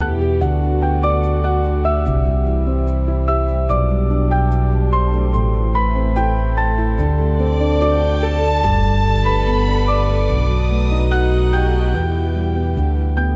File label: loop